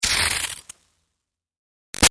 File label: Sizzle Iron Electrical Zap; Zaps; Electronics